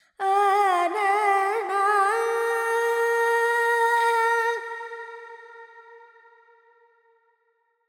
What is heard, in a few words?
FX Lydian, Vocals, Carnatic Female, Alaps; 70 G; Sample Banks